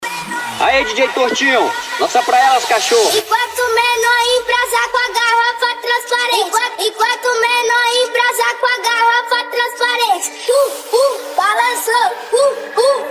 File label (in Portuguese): noia dance